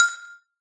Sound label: brick combo; candy crush saga online